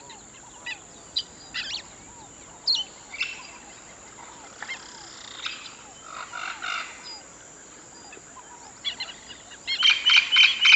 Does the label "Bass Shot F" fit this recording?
No